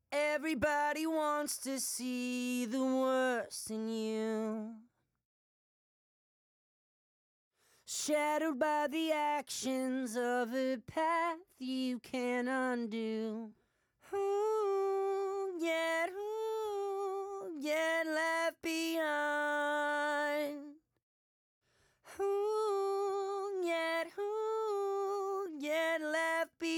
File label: main vox